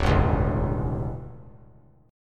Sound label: D Major 7th Suspended 2nd Suspended 4th D down 3C 3F 3A 3D, chord; DM7sus2sus4; strummed; JGuitar